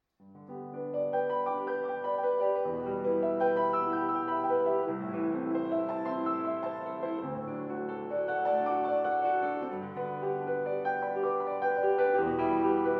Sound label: The Nutcracker Suite, Op. 71a (Arr. For Piano) VII. Andante maestoso